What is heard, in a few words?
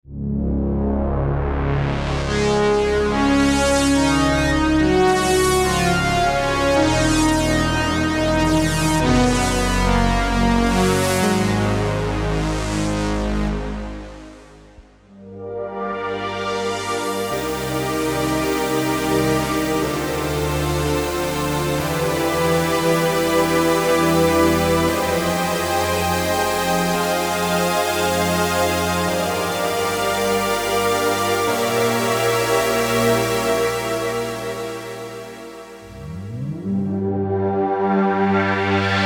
Pads